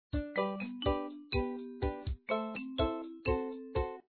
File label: jukebox music; gamerip; Papa's Freezeria